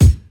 smooth bass drum single shot F key, Royality free kick drum single shot; kick drum samples